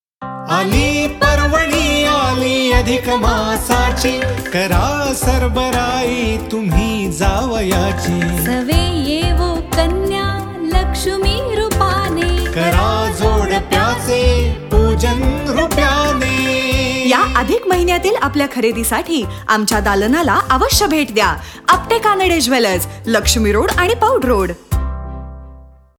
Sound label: Jingle; Pune; brand communication